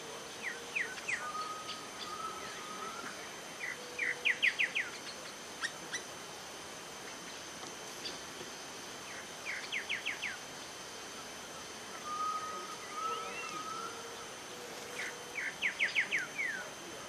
Saltators, پرنده پایکوب

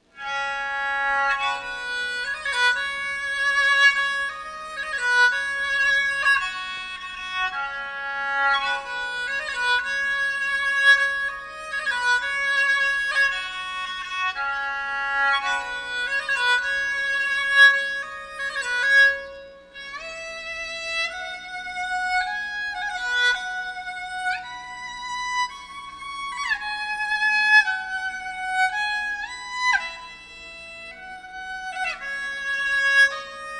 kokyū

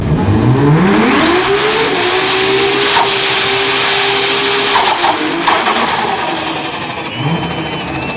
Toyota Supra

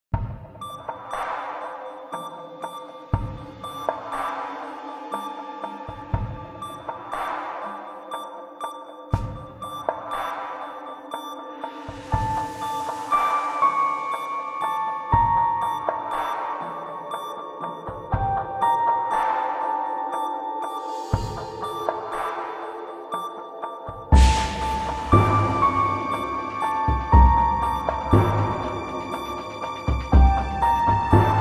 mysterious music

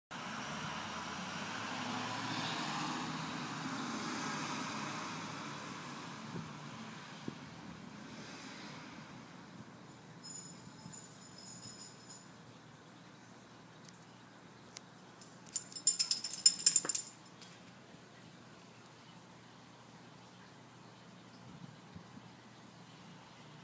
akita, سگ اکیتا ژاپنی

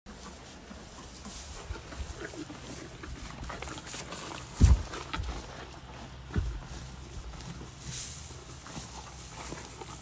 horse chewing

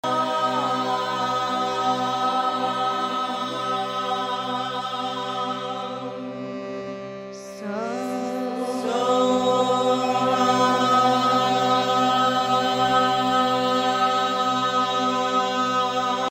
Soham, مانترای سو هام

